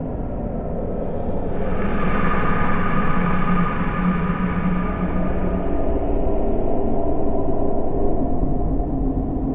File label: sg552 idle, weapons; buff sg552ex